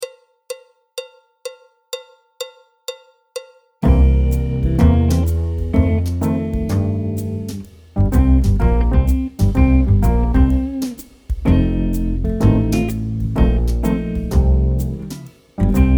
Cool Dude BT, alto, tenor, trumpet, trombone, piano; Jazz Syllabus Level 1